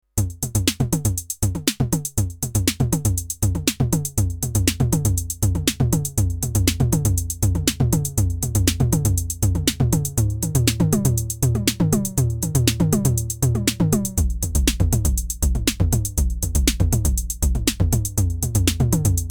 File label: Roland 7X7 TR8 606 Toms